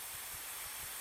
Release Helmet Gas

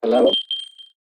ghost device ping

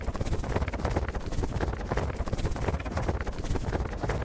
doublewing flap constant

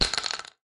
Drop Shotgun Shell

